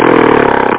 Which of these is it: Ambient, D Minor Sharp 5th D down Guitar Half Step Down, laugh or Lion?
Lion